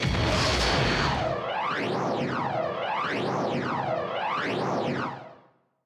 Battle SpecialMove Ready

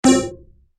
Match Powernode Land; Godzilla Smash3

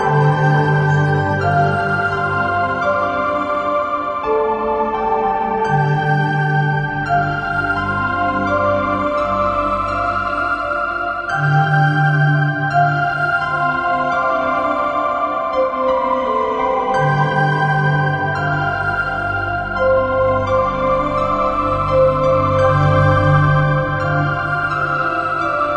The Elven Shrine - Explore - Ambient Loop

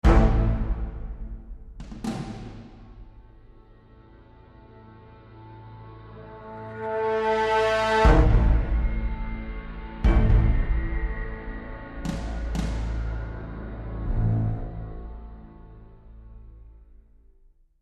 Tension

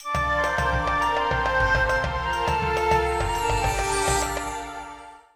Quest Completed; the princess heart

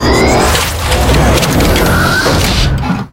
boss fight transform to plane

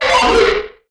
Riot Guard Pain; Prison Architect

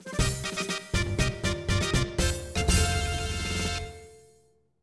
Fanfare; tokimeki memorial 3 yakusoku no ano basho de; ps2

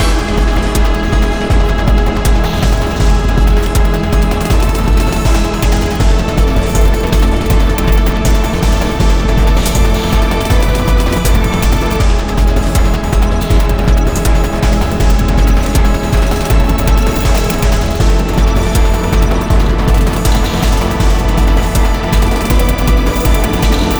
Steelworks Fast; rive wreck hack die retry; ps4

